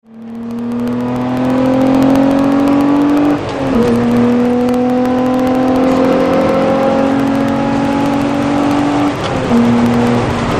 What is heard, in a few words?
engine